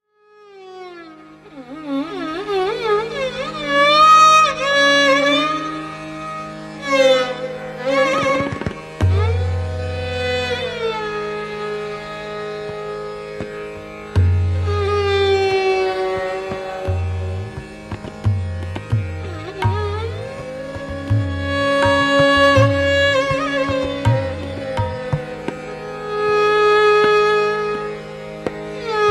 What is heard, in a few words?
Malkauns Vilambit Ektal; 1 Avartan